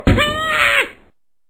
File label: Head Exploding; The Cheat